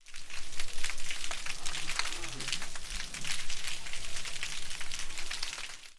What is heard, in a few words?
Snapping Shrimp; cetsound